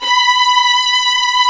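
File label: strings, Vlns 8 fff Agitato; Roland L-CD702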